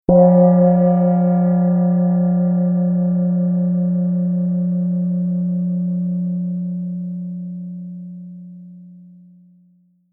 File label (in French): son gong petit